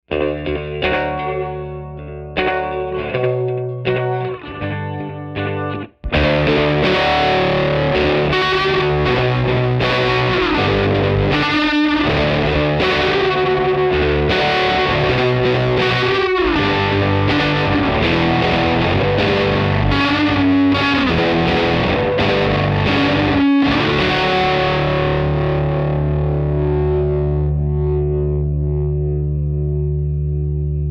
Fairfax uses the input signal from a Jazzmaster and an El Capistan to create a heavy; Nice / Shoes